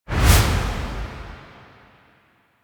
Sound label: Foundry Whoosh Out; Interior; Ambience; Warframe; Nineteen Ninety Nine; Tailor Shop